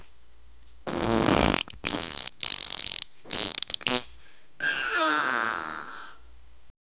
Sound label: a very wet and lumpy, human sounds